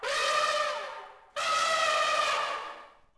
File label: Elephant; Ambient; Civ4